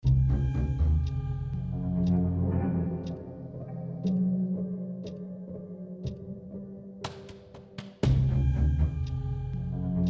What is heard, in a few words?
A Beach on a Loop